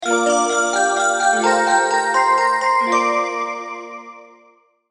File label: chant